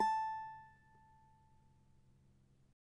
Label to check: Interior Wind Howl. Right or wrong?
Wrong